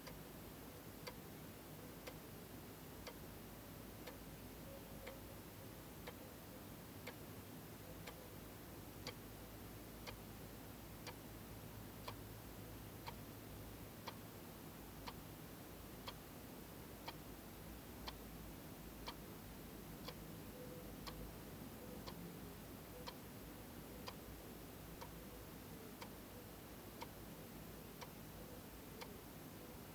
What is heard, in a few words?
indoor adult roomtone; LOOP; aino; anemoi